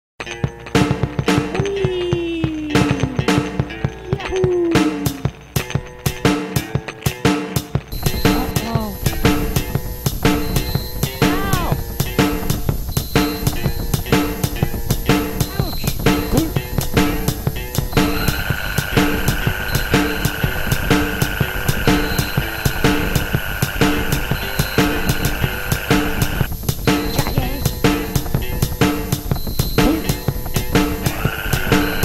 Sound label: Vocals